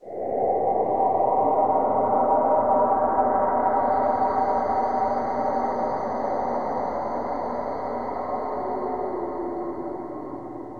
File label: skinwalker flesh gait stinger; slender skinwalker